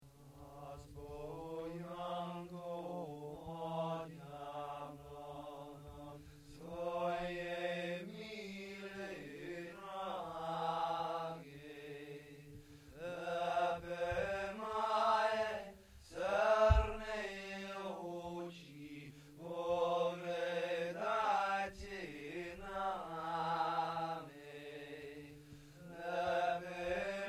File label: Sop, Sopranos; Zaspo Janko